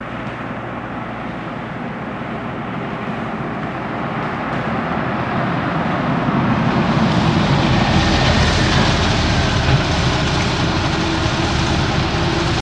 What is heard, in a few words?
Cadillac From 40 Mph